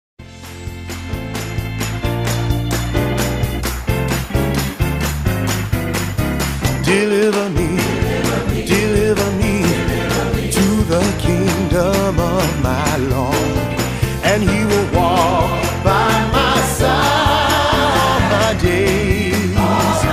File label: Gospel Singers Choir; Sunshine Gospel Singers Choir